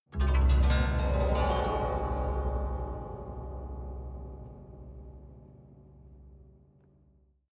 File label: haunted strings up stroke fast soft